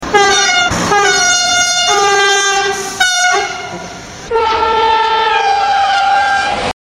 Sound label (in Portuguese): Buzina; Comboios